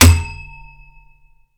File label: wall pole; Golden Crayon Sounds